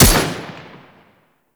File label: Turret Fire; Ambience; Warframe; Grineer Fortress